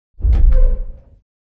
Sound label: Giant Pillar Distant Stop; Titanfall 2; Boomtown